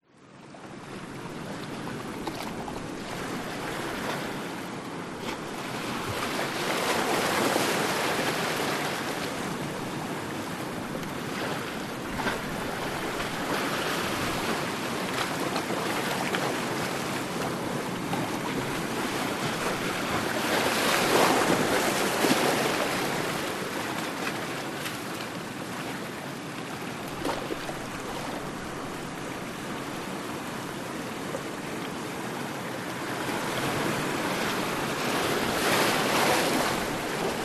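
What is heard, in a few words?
nature ocean waves medium splash into rocks distant surf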